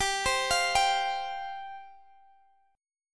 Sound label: G 7th Suspended 4th G down Guitar Drop D